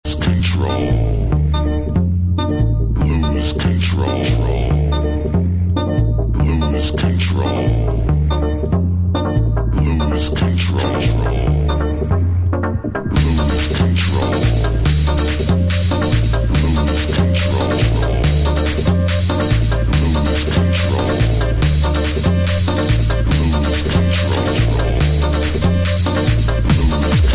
Deep Techno

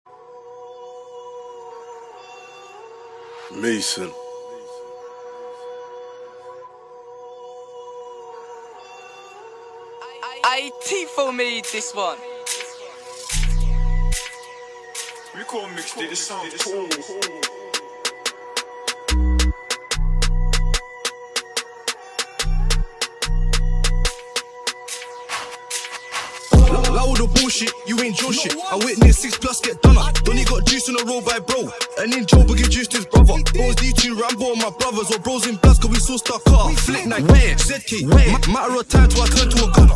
Drill

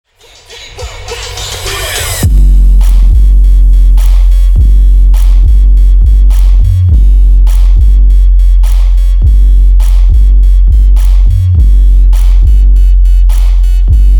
subwoofer Bassboosted

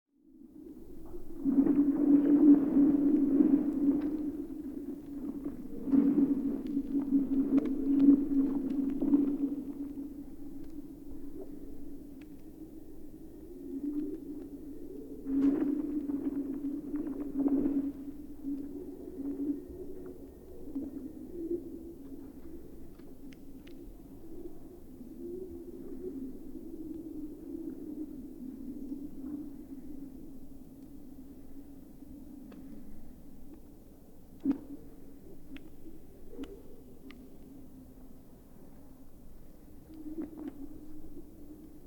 White Noise Wind

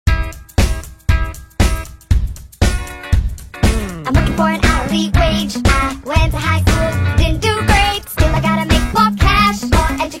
Commercial Jingle sped up loop

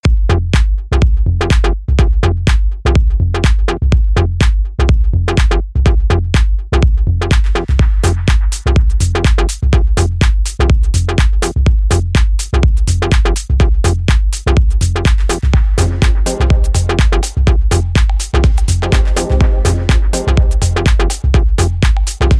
House Music Forest Chill

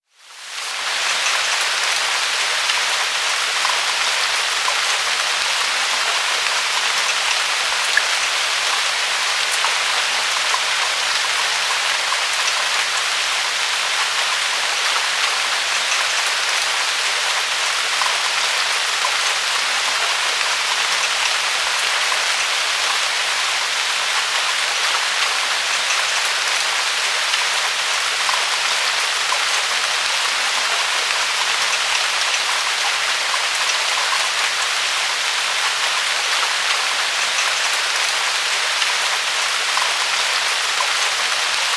Pouring Rain Late Night on City Street

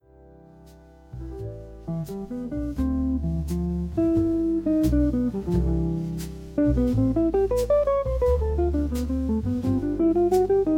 Soft Jazz

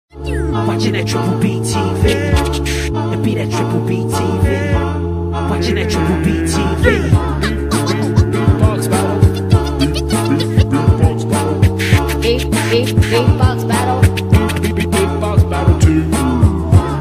Beatbox

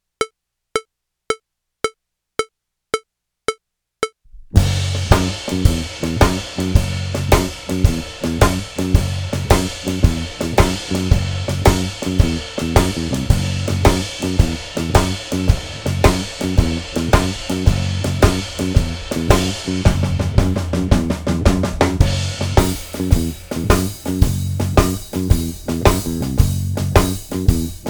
Gb Lydian b7 Blues Funk Minus Guitar

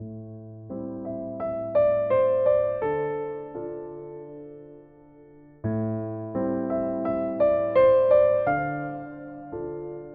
Jazz for A Rainy Day, Emotional Sad Piano

